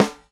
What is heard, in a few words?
Dyno Snare; Airwindows